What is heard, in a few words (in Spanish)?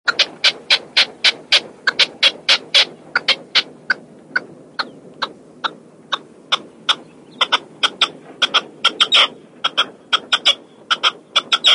perdiz